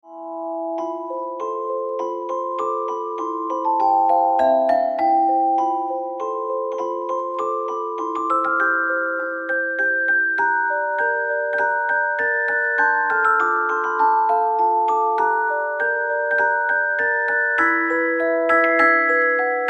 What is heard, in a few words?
marie main theme celesta version